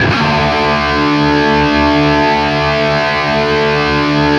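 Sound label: power D loop, guitar power chord loop; East West; Steve Stevens Guitar Samples Collection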